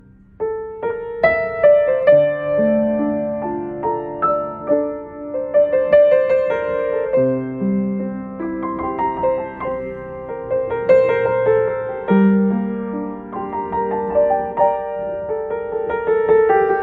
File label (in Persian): Ahang zang; زنگ موبایل خاص باکلاس